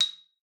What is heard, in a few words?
A#6, xylophone; Xsample VOL-4